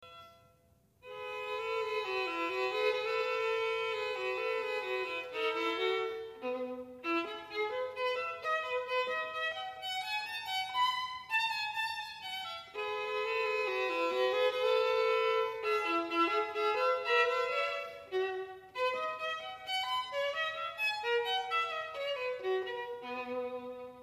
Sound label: violin